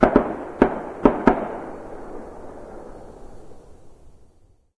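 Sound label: distant gun; random amb sounds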